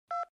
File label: phone dial